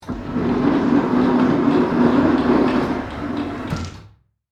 Door Close Automatic, Foley, Door, Close, Automatic, Sliding; Sliding Doors; BlastWave